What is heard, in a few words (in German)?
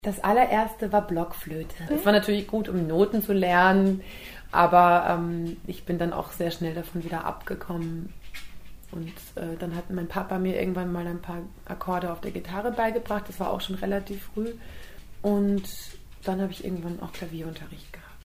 Die ersten Instrumente